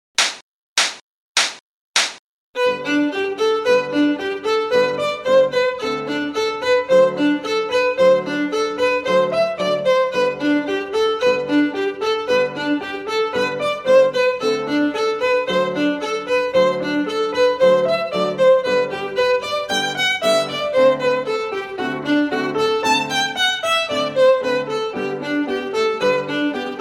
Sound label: ETUDE COMPLETO